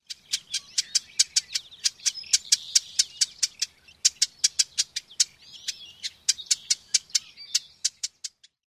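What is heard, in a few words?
excited chit calls, marsh wren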